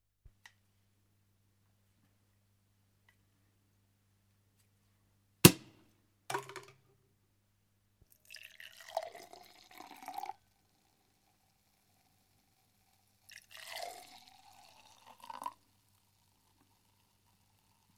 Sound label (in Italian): STAPPO BOTTIGLIA VERSARE E CIN CIN